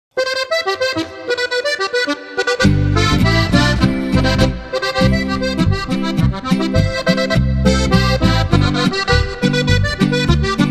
boarischer

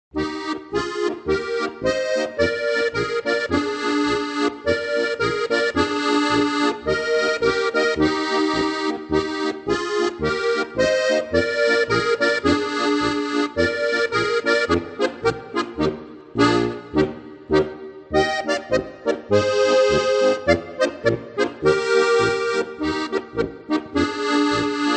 polka; Mein erstes Spielbuch

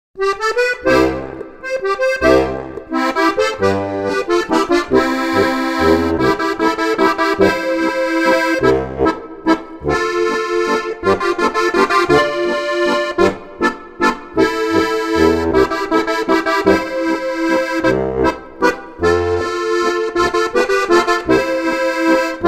landler

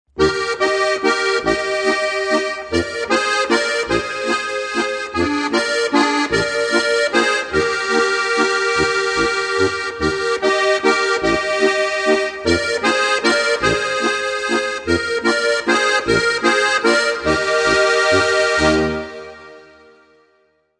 walzer

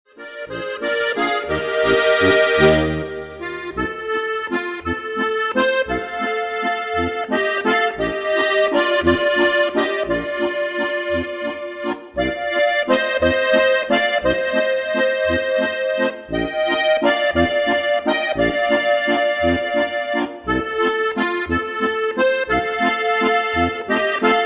walzer

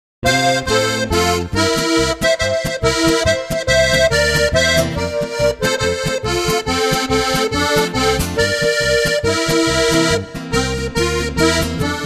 drauf los polka

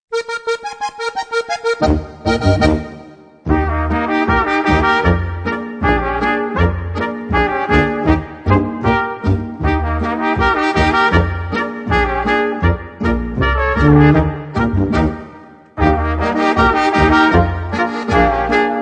boarischer

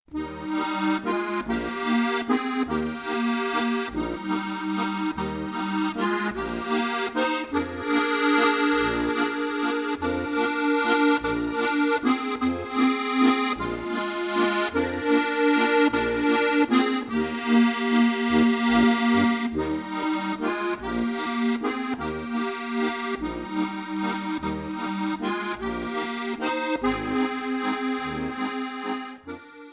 walzer

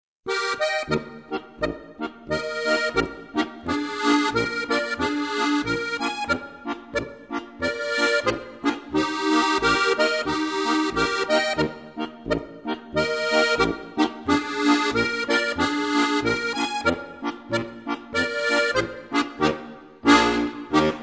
polka

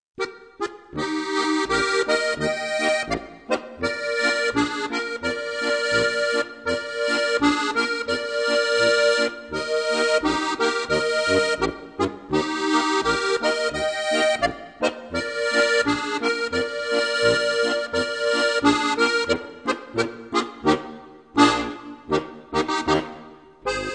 polka

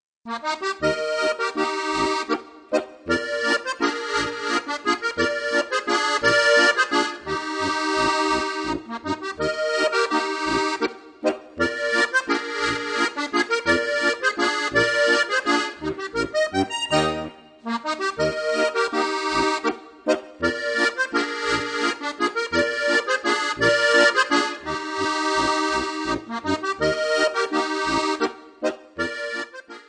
walzer

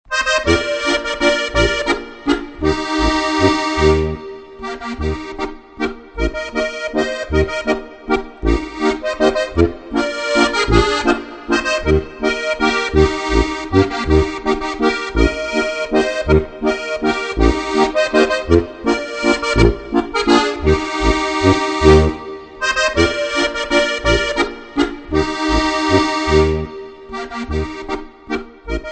walzer gstanzlform steirische harmonika; 1000 beliebte Gstanzln

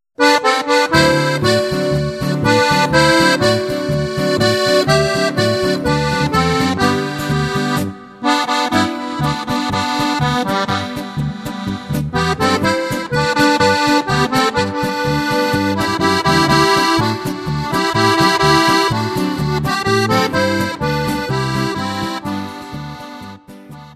boarischer

